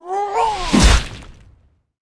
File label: troll attack, monster